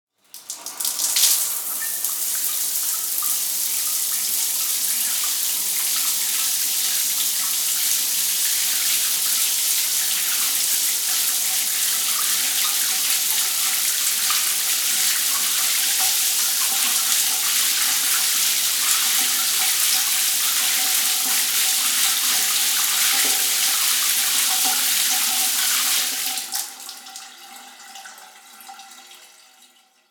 Shower

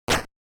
Chiptune Snare

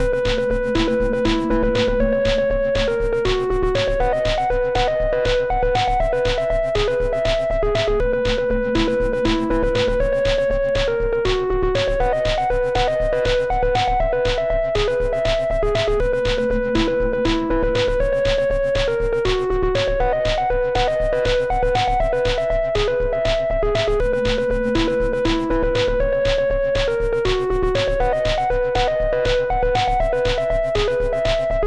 Gameloop Remix

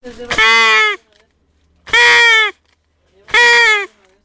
Party Horn

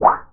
Metal Transition

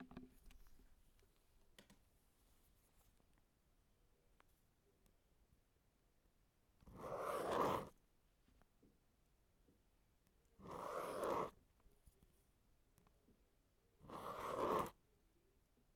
Pen On Paper Circles

